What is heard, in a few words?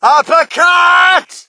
crowd, shout; Mike Tyson Heavyweight Boxing